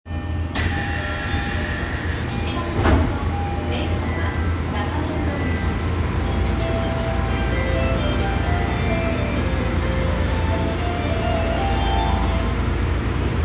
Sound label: train sounds; 日比谷線南千住南